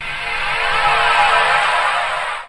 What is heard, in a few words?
Electro FX; Ueb HE Electro FX